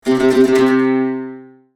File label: Dulcimer tremolo short